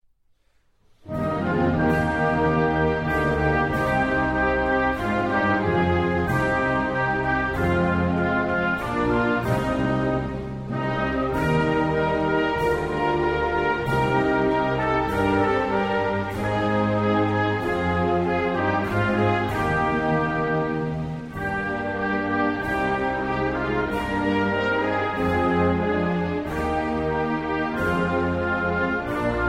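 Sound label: indian national anthem instrumental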